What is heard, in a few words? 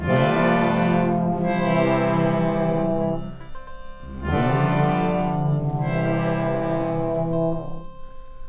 keys, Loops, Sad